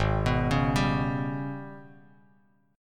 G sharp Minor Major 11th, Bass Standard; arpeggiated; JGuitar

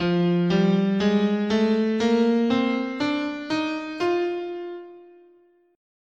F Bebop Minor, scale

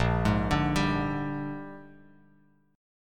A sharp 13th chord, Bass Standard; arpeggiated; JGuitar

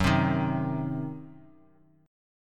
C Diminished Gb

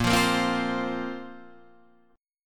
A sharp Suspended 2nd; A#sus2 Chord {6 8 8 5 6 8} strummed

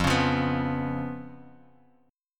B Suspended 2nd Flat 5th, F, chord

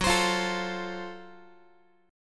Gb Minor Major 7th Flat 5th, Guitar, chord; strummed; x,14,15,15,13,x; JGuitar

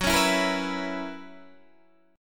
Gb 11th, Guitar, DADGAD

